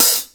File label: Hi Hats; InVision Interactive; Lightware VOL-1